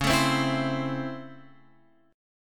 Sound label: C sharp Minor Major 7th Sharp 5th C sharp Guitar Open F; C#mM7#5 strummed; x,8,9,7,7,7; JGuitar